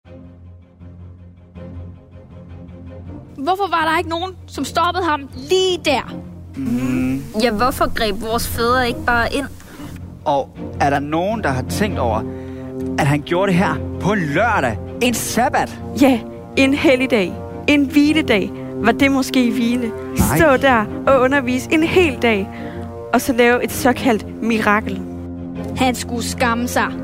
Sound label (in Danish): Drama musik og drama